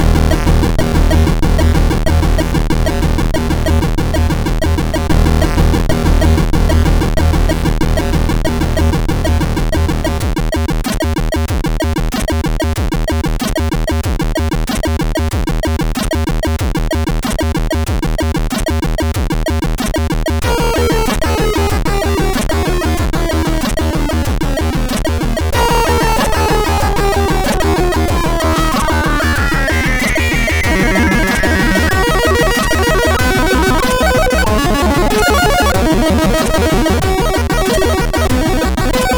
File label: sid, CSG8580R5; Short 64